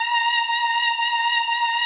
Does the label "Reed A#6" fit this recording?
Yes